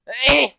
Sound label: ehhh